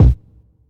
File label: puffy kick drum b key